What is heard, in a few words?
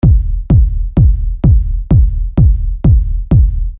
Low Kick